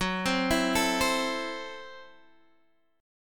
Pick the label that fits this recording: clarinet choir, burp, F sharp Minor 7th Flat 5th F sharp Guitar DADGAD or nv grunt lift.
F sharp Minor 7th Flat 5th F sharp Guitar DADGAD